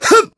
Attack; Ghirahim; zelda sounds; Skyward Sword

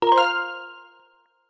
Main Menu Select; Make a selection on the main menu; Skyward Sword; zelda

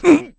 Sumo Push; Sumo match: Bo pushing Link

